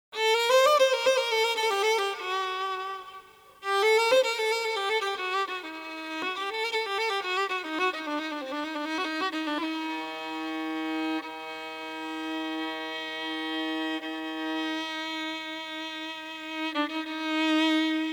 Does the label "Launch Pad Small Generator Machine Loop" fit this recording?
No